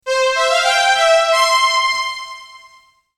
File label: Level complete sound effect